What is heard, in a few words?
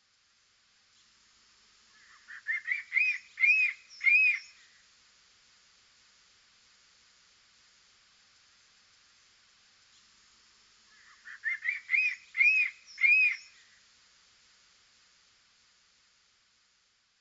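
Crested Serpent Eagle, ishigaki; kanmuriwashi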